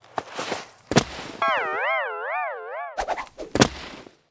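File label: snowboard action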